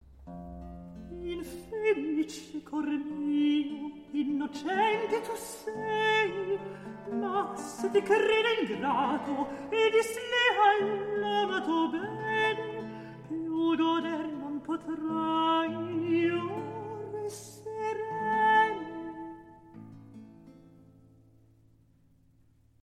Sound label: Recitativo; Orlando Furioso, RV 728, Act II, Scene 1; Vivaldi; Jean-christophe Spinosi